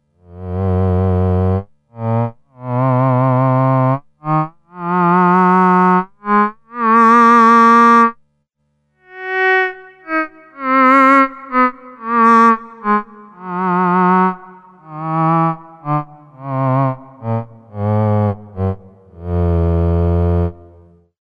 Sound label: theremin